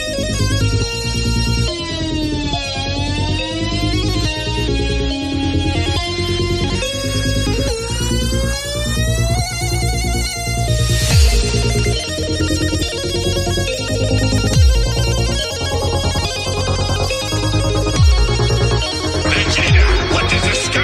DUBSTEP

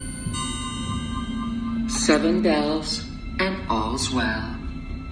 Bells

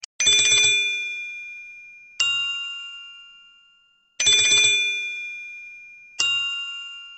Bicycle

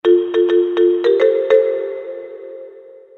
Cute Sms Tone

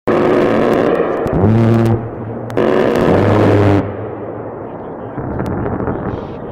A-10 Burst

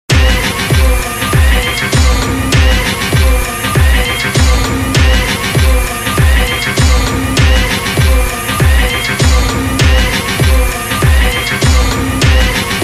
Standby Loop; Rogue

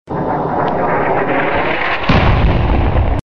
Kaboom

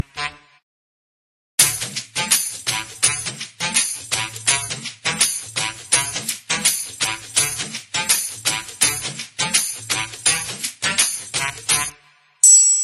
Tamil; Pokiri

